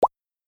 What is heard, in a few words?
Wrap Forward; business scheme beta 2